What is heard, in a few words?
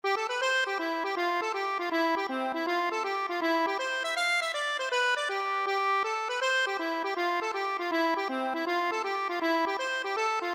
hornpipe, accordion